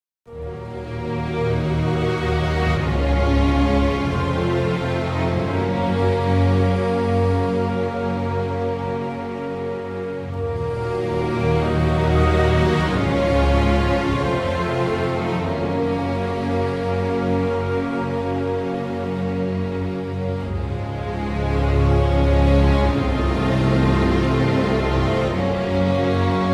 Instrumental

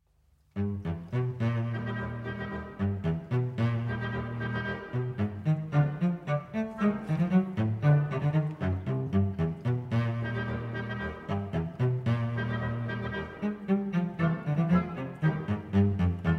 Cello Concerto No. 1 in E-Flat Major, Allegretto

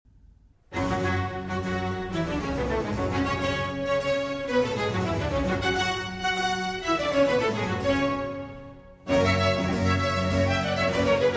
Cello Concerto in G Minor, Allegro; Vivaldi

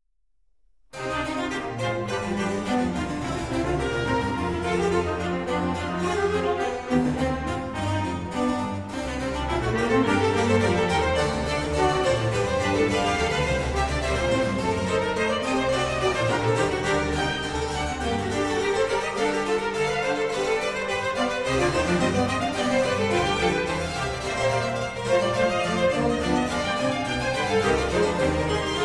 Concerto For 2 Keyboards in C Minor, Allegro

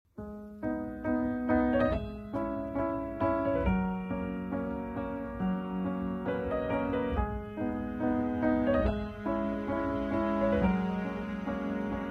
Karaoke; Eurovision 2023 - Switzerland

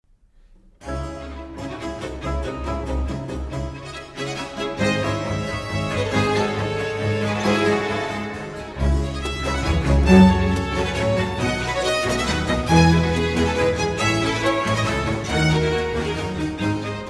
Cello Concerto in D Minor, Allegro

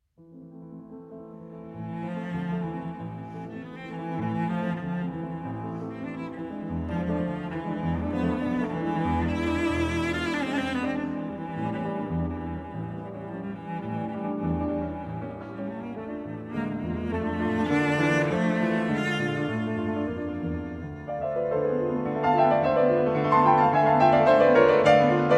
Cello Sonata in A Minor, Allegro Agitato

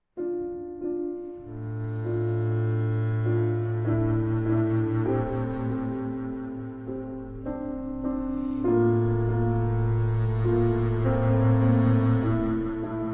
Double Bass & Piano

